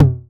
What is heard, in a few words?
high tom